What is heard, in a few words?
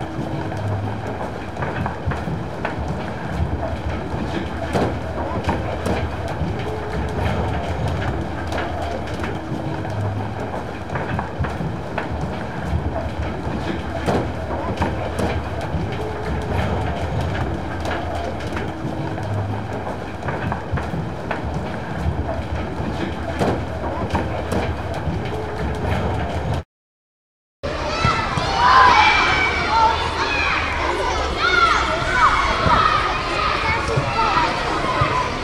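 atmosphere shopping center children; best service Blue Box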